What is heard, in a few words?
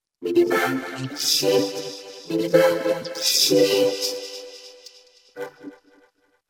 vocoder; 声码器; Korg RADIAS 合成器; 所有声音均由RADIAS发出，只用了一个音色（无音色叠加）